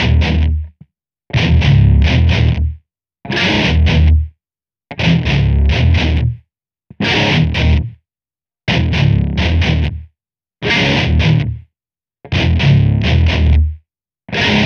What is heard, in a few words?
Guitar, Rock